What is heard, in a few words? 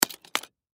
Knife Set Down, Knife Set Down Rocks; Foley; Blastwave